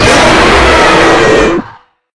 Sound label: Evil Scary jumpscare; Evil Scary jumpscaring a player; Die of Death